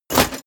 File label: metal plate armor movement fast